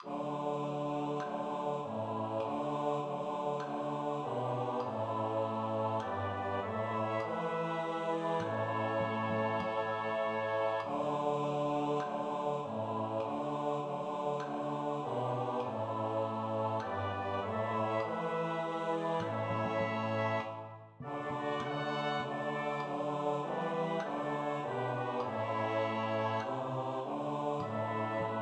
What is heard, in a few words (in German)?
Bass; Kantorei